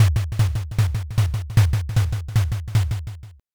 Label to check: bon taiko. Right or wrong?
Right